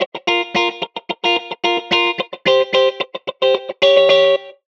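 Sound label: funk guitar mix, ensemble reverb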